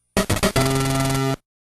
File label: fanfare; kirby s dream land 2 ost